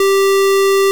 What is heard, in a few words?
OB SQR LD G3, OBERHEIMS